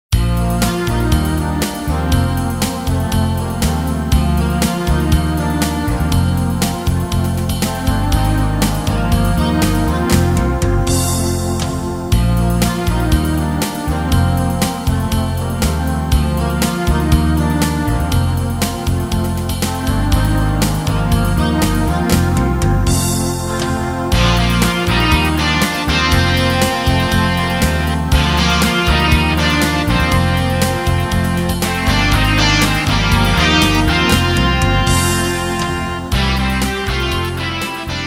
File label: Short Rock